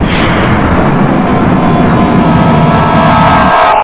jumpscare; old freddys a place of fun

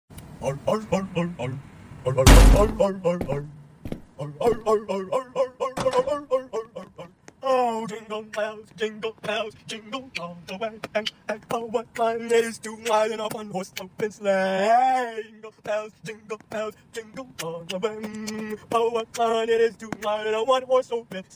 beatbox; freddy fazbear santa

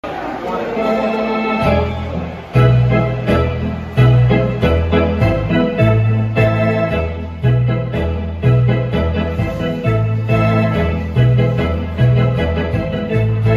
kalinka on the organ

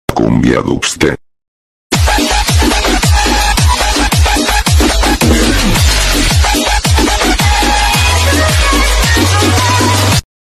cumbia dupstep

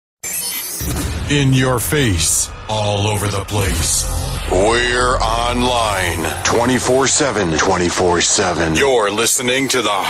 morning show jingle

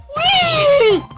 wheeeeeeeeeeeeeee; kane

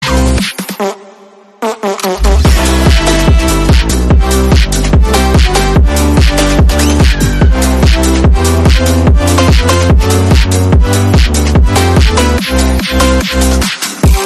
fart phonk

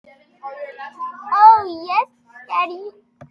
short noises

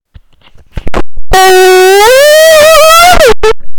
uwu scream

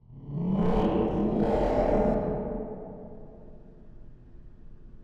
demon roar

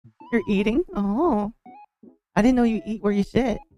eating

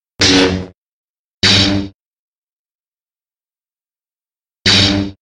lightsaber sound

